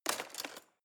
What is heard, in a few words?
knives wood drawer place